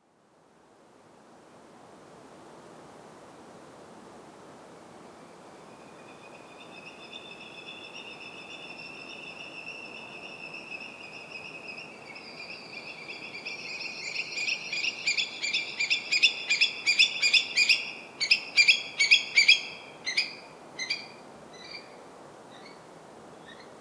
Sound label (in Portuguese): alcaravao Burhinus oedicnemus; Eurasian Stone-curlew (Burhinus oedicnemus)